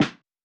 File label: snare; bespoke - Floral v.1; drum oneshots